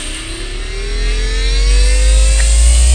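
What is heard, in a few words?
power up; Total Chaos Nukem 3d Pack; duke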